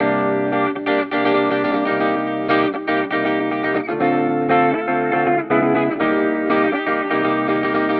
120 F Cosmic Daze, Spaced Out Guitars; Loops; Touch Loops; CosmicFunk

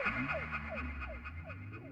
ethno, 125, A

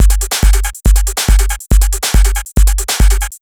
TECHNO BEATS 140

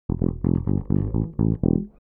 DEEPHOUSE BASS 125 A

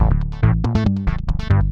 SNTH BASS ELCTR 140 A, Techno

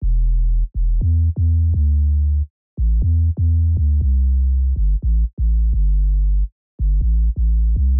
Bass Dirty Boomer C 120bpm; Touch Loops; Old Skool House - mini pack

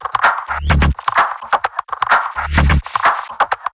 drum loop 128 Perc; Anthemic

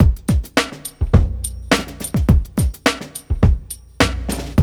GROOVY 105; 100-114 BPM

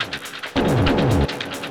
TECHNO FX 140

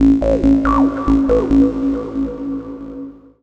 TECHNO EXTRA 140

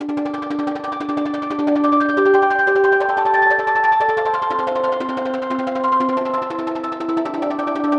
120 Gm, Arps; Loops; Knifing; Touch Loops; BlissedOutBeats

